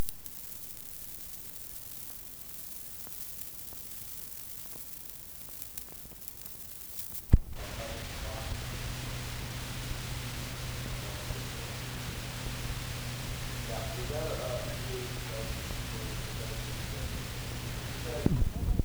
Audio Tape Noise; SFX; IRL Recorded

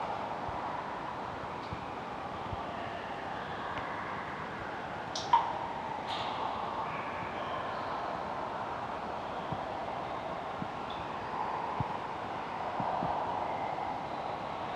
Reverberated water droplets; SFX; IRL Recorded

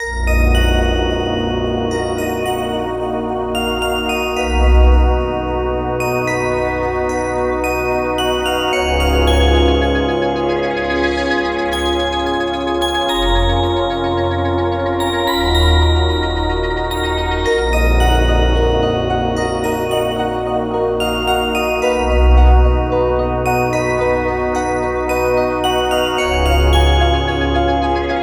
Melody 110 BPM

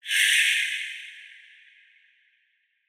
Sshh; SFX; Ambient - General

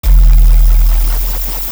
Bass Noise; 808

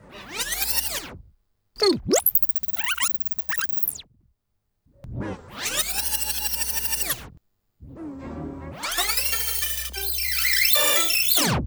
Tape rewind sound; SFX; IRL Recorded

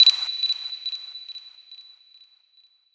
Camera, Beeps; SFX

